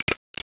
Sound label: stapler